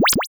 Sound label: blocker powersave